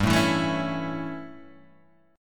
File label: A 7th G, chord